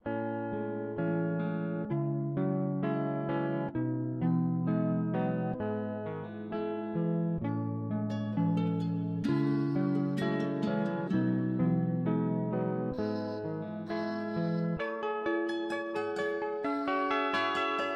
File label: Electric Guitar 130bpm G major 7